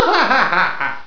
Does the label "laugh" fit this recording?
Yes